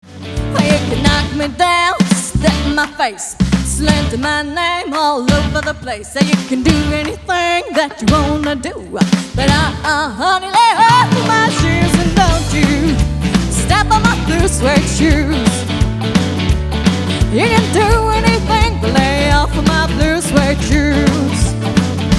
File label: rockn swing medley